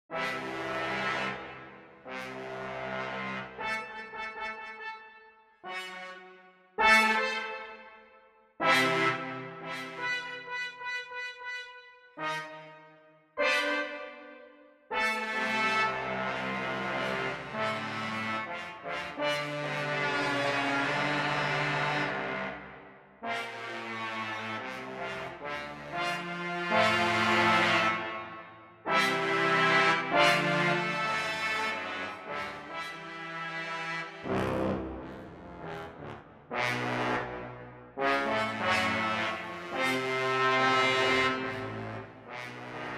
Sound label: Hot Brass